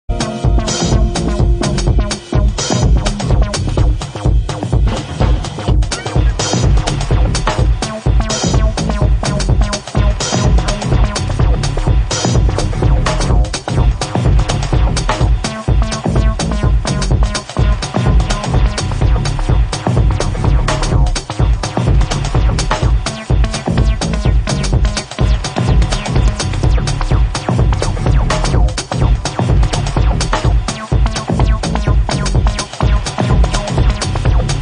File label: Drones